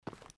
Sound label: Footsteps